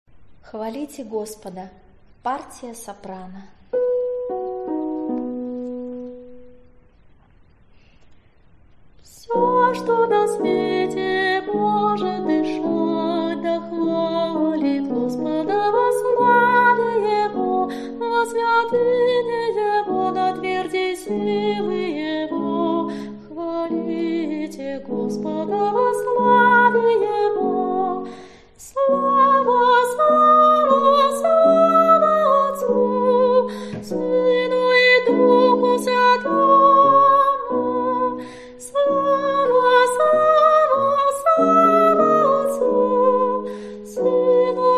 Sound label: soprano; Xvalite Gospoda